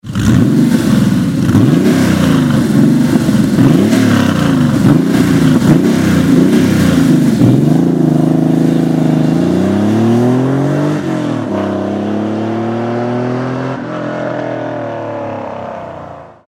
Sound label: Porsche 911 Typ 964 Valve opened